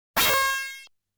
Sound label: Player Laser Sound; 1500 series collectors box toaplan 1993